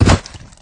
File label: limbs, flood